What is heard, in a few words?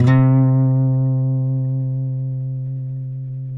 GUITARS TAKA FINGER; Spectrasonics; Hans Zimmer Guitars VOL-1